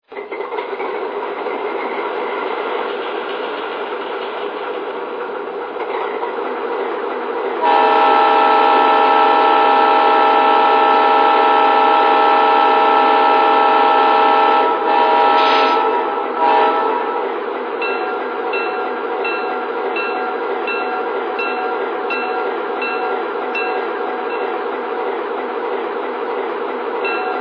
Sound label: RS11, trains